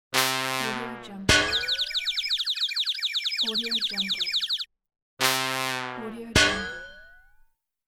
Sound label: cartoon fail with head impact